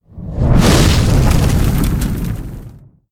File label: swing fire